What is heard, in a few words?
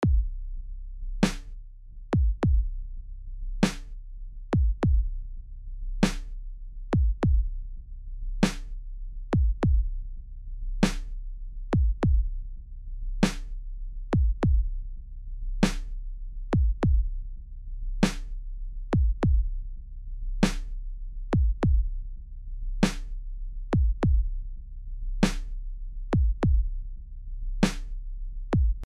sub bass effects